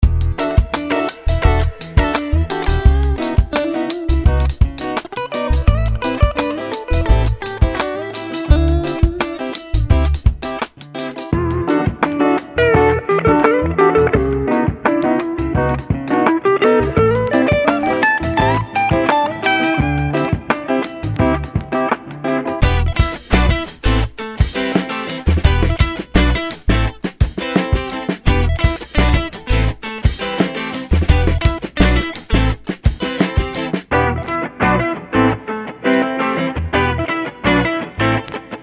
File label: Hi Fi Lo Fi Guitar Funk